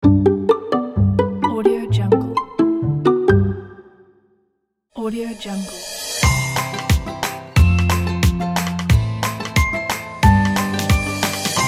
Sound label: happy logo pack